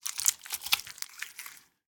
gore slime creature meat blood medium movement various; sonniss gdc